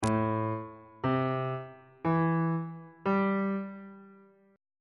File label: b double flat minor 7th chord intervals on bass clef